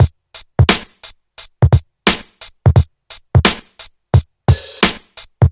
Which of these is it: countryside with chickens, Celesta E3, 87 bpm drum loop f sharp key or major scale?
87 bpm drum loop f sharp key